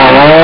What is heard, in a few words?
Chainsaw Attack